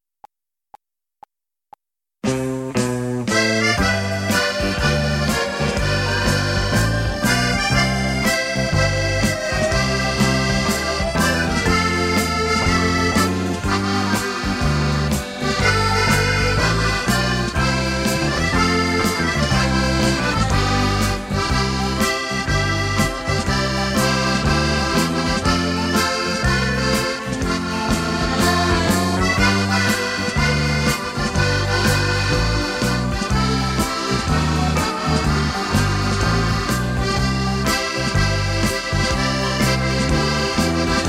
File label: Accordion Medley, backing tracks irish country pop